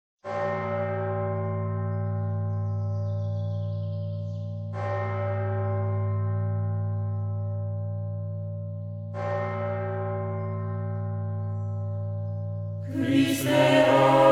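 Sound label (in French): Ensemble harmonie géorgienne Tropaire pascal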